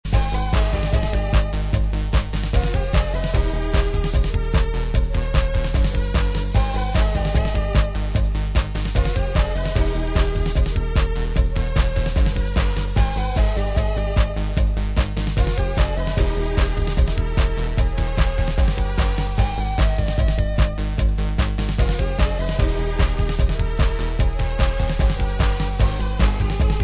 drums & trumpets; electro

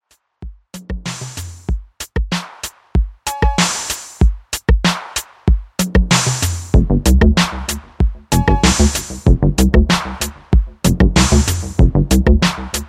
glow 808 tape

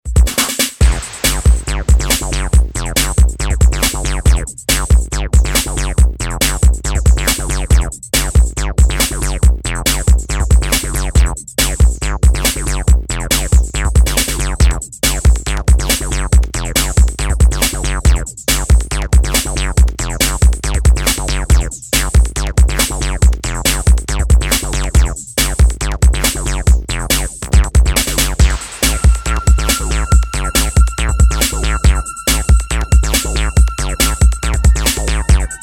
Program A Beat (Instrumental)

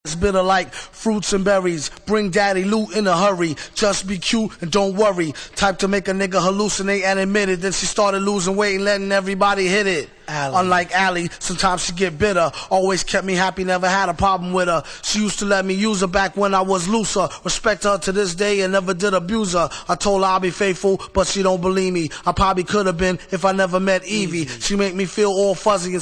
acappella

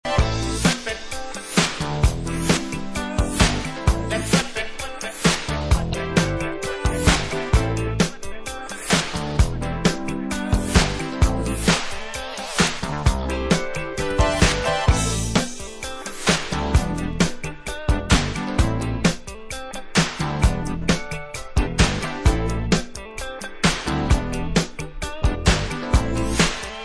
Instrumental

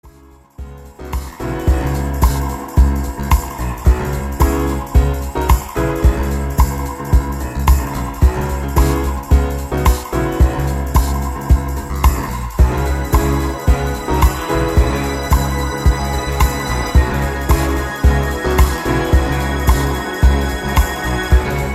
Instrumental; disco

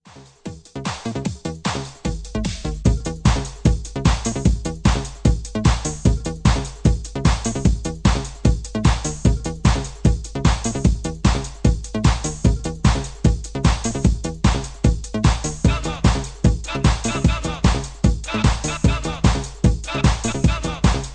toms bomb

